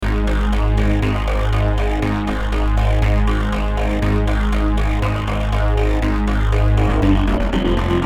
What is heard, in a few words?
background music